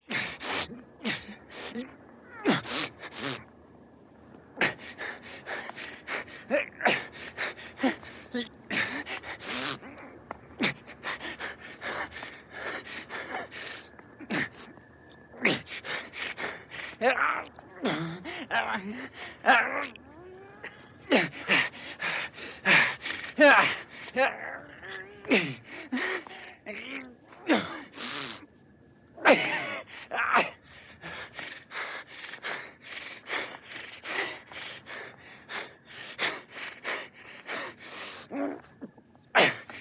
stoni i kriki ot boli

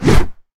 nesilnij udar mechom po vozduhu

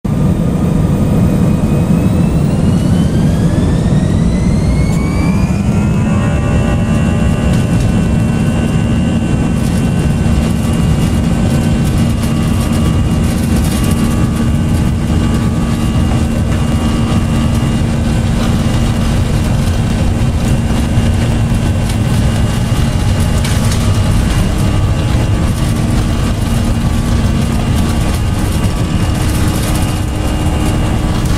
airbus a 319 vzlyot

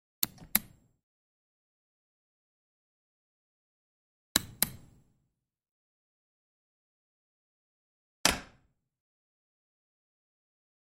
zvuki dvernih knopok

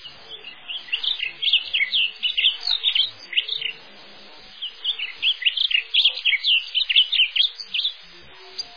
prodolzhitelnij zvuk shebetaniya dubonosa

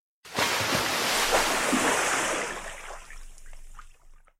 zvuk volni

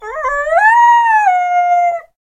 layushij ispugonnij gromnij laj kojota

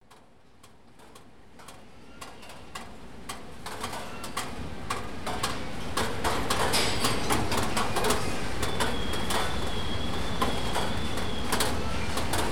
kapli dozhdya na otkritom karnize popali v metallicheskoe poncho posle dozhdya

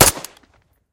metallicheskij lyazg zatvora vintovki pri vistrele

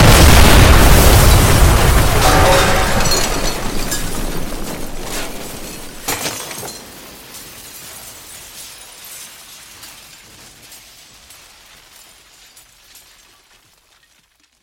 moshnij oglushitelnij vzriv ot vistrela

